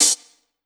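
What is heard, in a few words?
OHH -R, open hi-hat, Drum & Bass; Ueberschall; Drum & Bass Resonance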